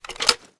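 weapon foley assault rifle grenade launcher reload open; weapons; gmod; viper; scharlie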